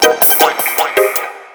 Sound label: current alert